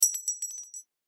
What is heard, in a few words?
Ring Drop Bounce Cement; Jewelry; Drops (Sound Effects:Objects); Foley; Blastwave